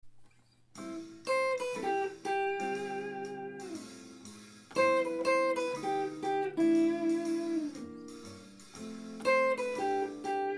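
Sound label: slow jazz, guitar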